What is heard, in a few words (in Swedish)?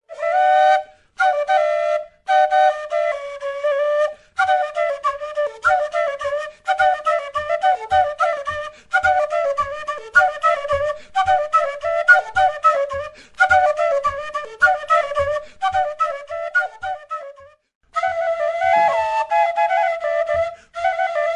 dubbelpipa